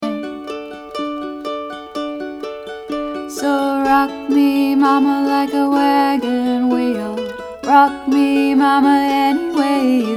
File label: chorus melody